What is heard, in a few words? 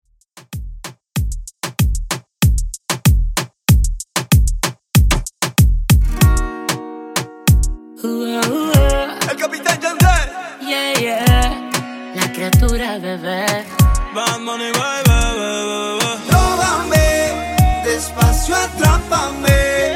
Latin Party Reggaeton 95Bpm